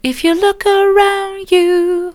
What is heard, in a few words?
soft, vocal